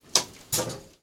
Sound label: power relays, Train; OpenBVE; Cl313 Silverlink